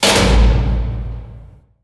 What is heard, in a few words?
Door Large Metal Stop; SFX Kit; Doors